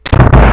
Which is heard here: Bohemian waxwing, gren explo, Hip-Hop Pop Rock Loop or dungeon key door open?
dungeon key door open